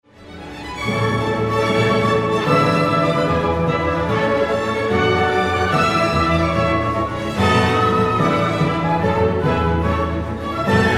Sinfonia n. 4 in la maggiore, Allegro vivace; Roma Tre

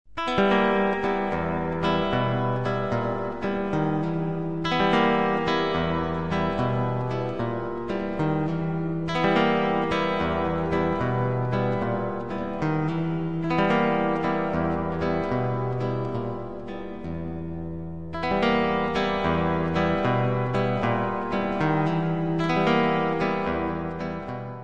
Dissonanze; Musiche Film; colonna sonora

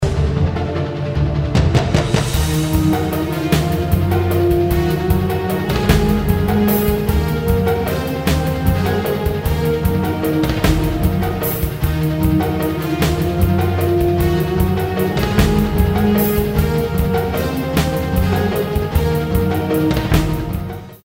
FUGA; Suite Coll. Vol.15

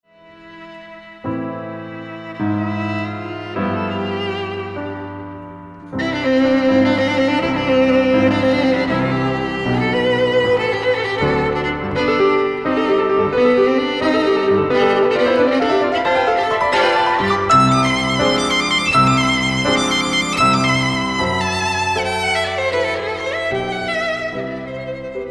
Suite per violino e pianoforte Preludio; Alessandro Longo

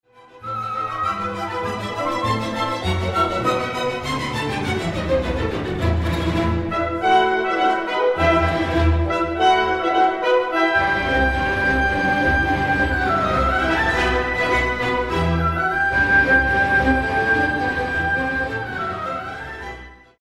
Salterello (Presto); Roma Tre

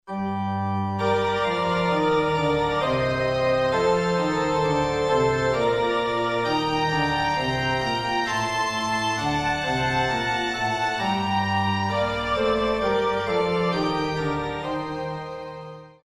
LARGHETTO; Musica Sacra; Antologia di Musica Sacra Vol.2

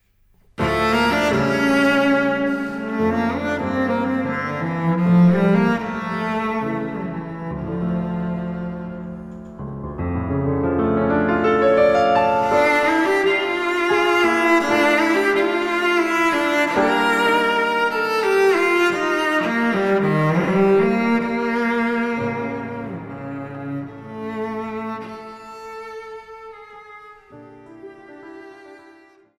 Suite per violoncello e pianoforte Preludio; Alessandro Longo

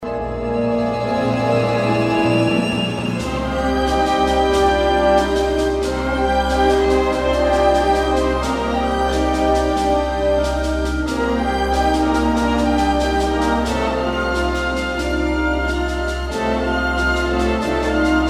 Concerto per orchestra

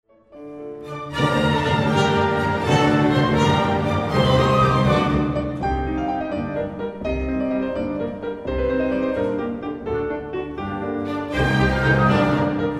Allegro vivace; Roma Tre

